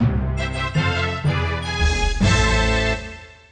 Jubilance Minor Up; HoppiX

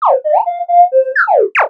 robot idle; Earth and Beyond; EA Games

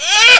moo

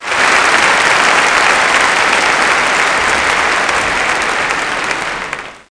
applause

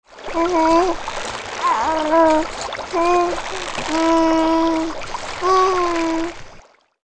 basket

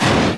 Crunch, Bike; CASTROL

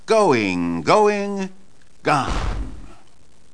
Harley; Vehicles; Harcloseprogram

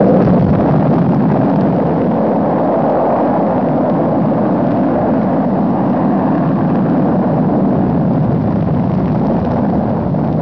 ROCKET SOUND; Tony Quinn's Virtual Worlds; Wayzata Technology

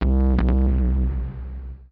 BASS SHOT HOUSE 125 A; eJay; Singleshots

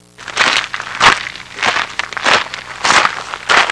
trudging; Sound Effects for Multimedia - Volume 1

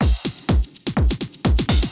drum loop house 125; eJay

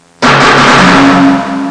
trash can, cartoon sfx; Sound Effects for Multimedia - Volume 1

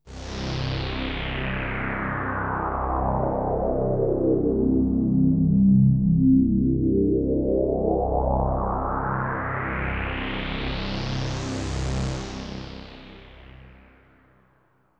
synth pad ambient 160 C; Sphere

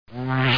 ZIP IN

